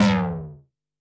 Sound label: Tom Mid, Korg MS-20 Drums